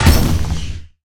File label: first hit; smite; horus